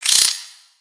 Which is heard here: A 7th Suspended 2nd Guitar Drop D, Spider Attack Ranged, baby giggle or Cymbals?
Spider Attack Ranged